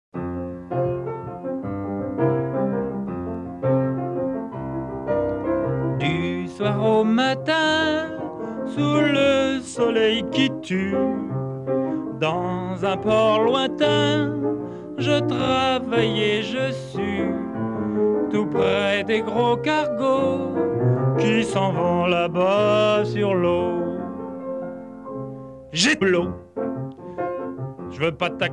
Calypso blues

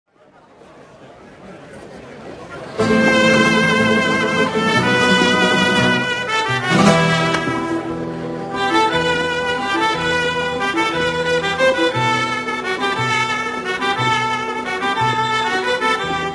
Spanish Tango

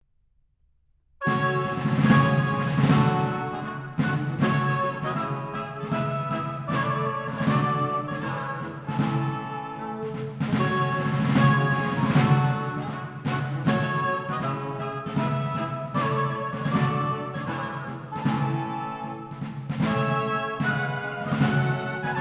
Slow Air

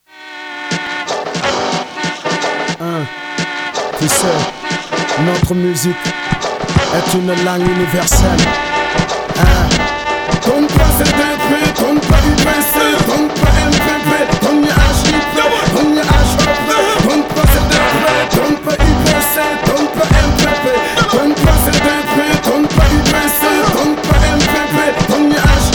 Hip Hop

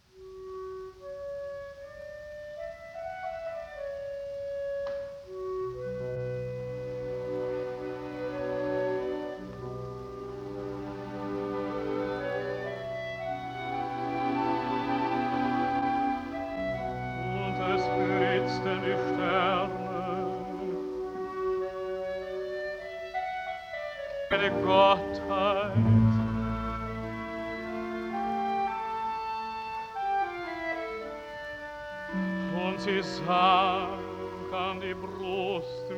E lucevan le stelle, sung in German; Puccini Tosca Act 3; Cavaradossi

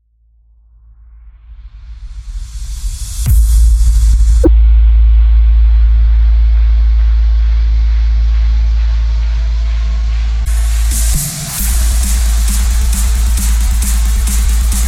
Festival Trap Remix

